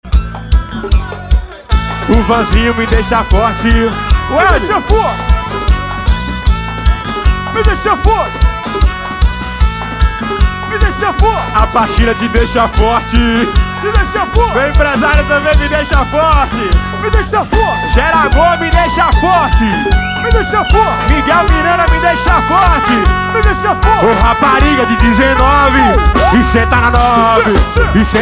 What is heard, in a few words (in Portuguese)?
pout porri pagodao samba